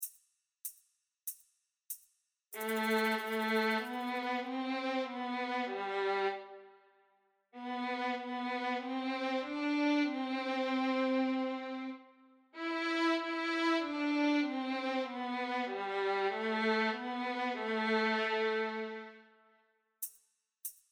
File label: Karaoke Fiefia i he Eiki